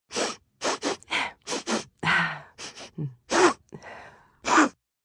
Sniffle Breathe, Sniffle, female, with breathing, cold, sick, (human voice); Sniffling; VoiceBox